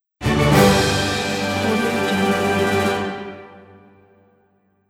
short winner fanfare

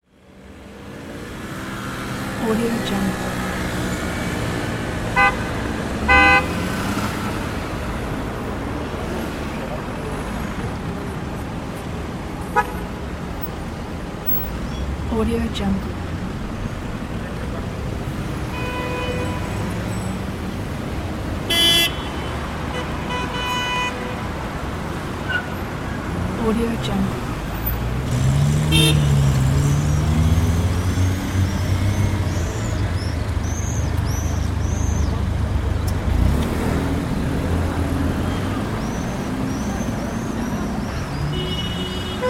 traffic noise

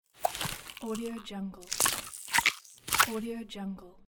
carnivorous plant; tibasound

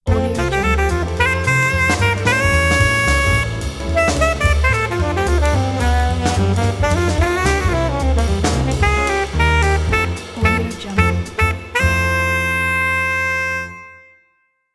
hard bop jazz logo

